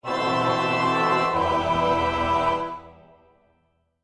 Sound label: Coro Terror